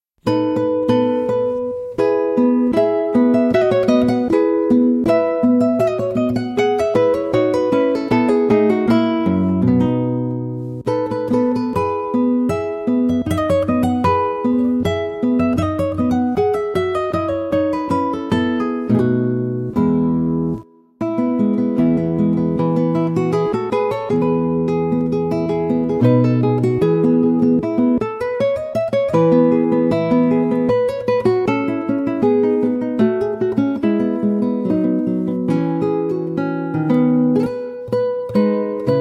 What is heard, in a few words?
Minueto, guitar; بهترین آهنگ بی کلام گیتار